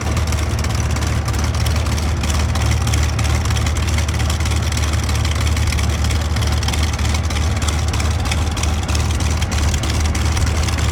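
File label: Plane P40 Interior Taxi Loop; Airplanes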